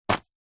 magazine cheap set down carpet; household; zapsplat

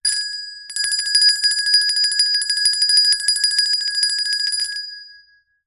bells small bell ring random fast

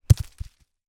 impact rock fall hit ground forest floor

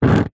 nature fire flame air wind blow blast fast short

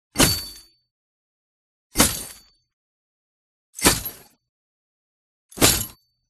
FOLEY ARMOR Chainmail Impact; smartsound

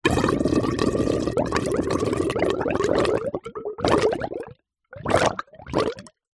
water gurgle bubble could be blocked drain; nature

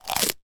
human person bites into rice cake

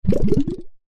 bubbles blow

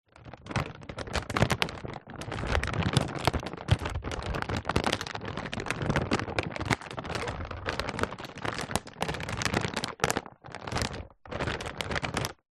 food flour packet large handling

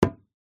instrument drum gamelan hit single

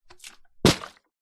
bottle flip plastic water bottle throw flip land; zapsplat

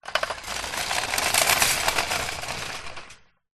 shopping cart metal push on concrete ground short

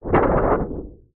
foley paper laminated large sheet shake wobble

